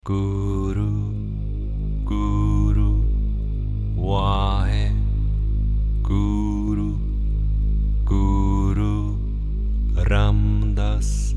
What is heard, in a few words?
Meditation; Guru Ram Das